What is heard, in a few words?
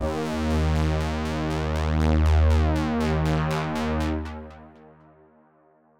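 analog D#2; analog server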